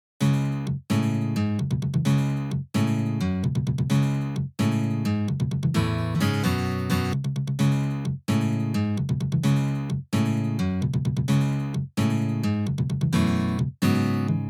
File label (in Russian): Melodiya iz Stalkera na gitare, gitare